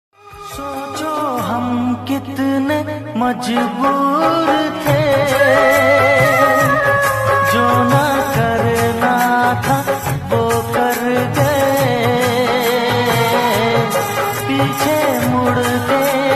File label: Heart Touching Ringtone